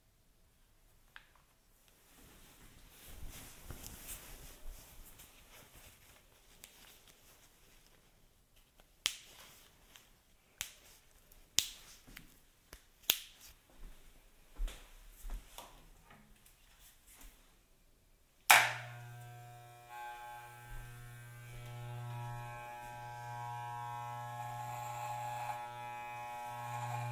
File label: Hair cut, Binaural Audio, barber; Experiments with Binaural Audio; youtube